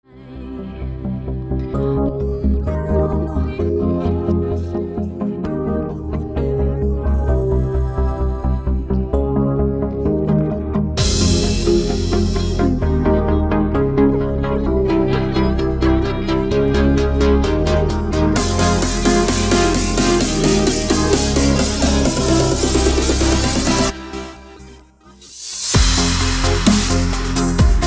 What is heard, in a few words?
Instrumental